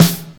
trebly snare sound E key, Royality free snare drum sound tuned to the E note